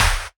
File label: Snare, Dubstep One-Shot Drums